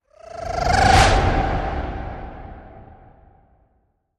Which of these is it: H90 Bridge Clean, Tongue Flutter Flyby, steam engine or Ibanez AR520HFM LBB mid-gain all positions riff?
Tongue Flutter Flyby